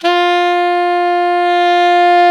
F mid, alto sax; Giga Samples Collection; Brass

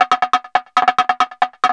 drum 138, drum-loops, Techno

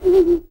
TUV BLOW, World Voices, TUVAN GROAN; Spectrasonics Vocal Planet CD5

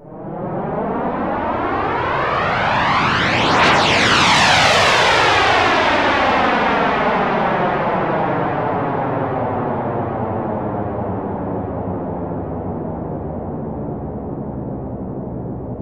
jet, synth jets; Zero-G Ambient 1

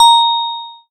BELL-GONG, A#; Big Fish Audio

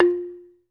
BAMBOO, Pitched Percussn, Bass Bamboo Mrmb; E-MU Producer Series Vol. 7 – Old World Instruments

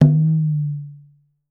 tumba, congas; Voice Crystal - Steve Reid Definitive Percussion

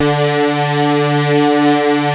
Prophet 5 D4, Synthesizer; Hollywood Edge - Giorgio Moroder Rare Synthesizer Collection

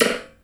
Thunder Drum

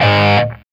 SOLDANO ROCK, Guitars; Spectrasonics; Hans Zimmer Guitars VOL-1

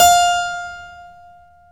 KEY HRPCR; Roland - LA Composer vol. 1

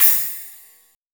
Ride set, Drum and Bass

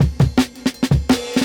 Jungle Breaks

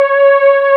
SYN STARRY; Sound & Vision - Gigapack I CD 2; JD 800/990

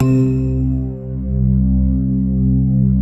block pads; Pro-Rec - Synthsonic

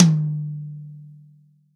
TOM, Real Drum Kit, POP KIT

